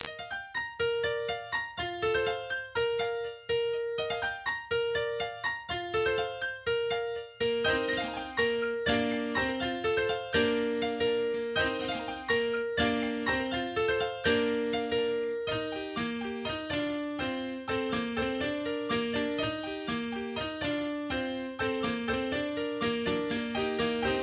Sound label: piano